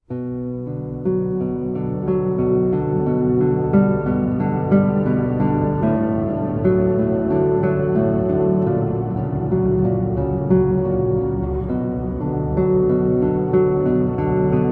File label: Guitar; Arr. Cousins